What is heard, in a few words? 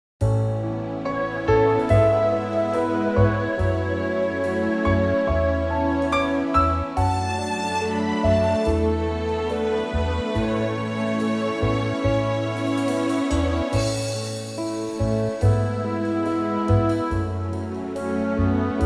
A orchestra